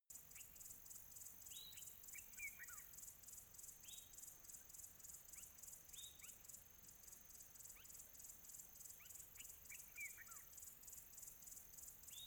quiet tropical birds atmo

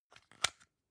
plastic hook fold out

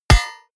hit metal hammer medium

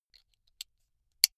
buckle plastic insert unsteady close soft

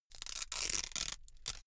velcro large open slow

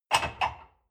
dishwasher cup place soft

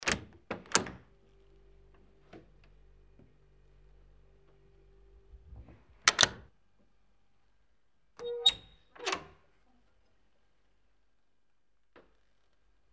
door open close

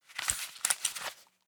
leather belt open heavy

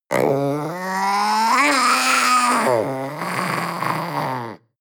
panther young vocal cry

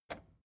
record player repeat release

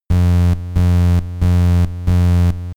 spaceship alarm

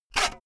saw use short quick

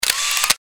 camera single shot

